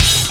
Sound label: GTR; Roland - LA Composer vol. 2